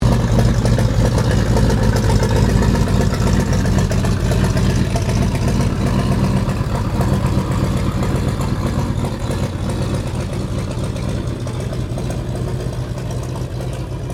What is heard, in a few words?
1916 Sopwith Pup replica taxi, airplane; Airplanes; Vehicles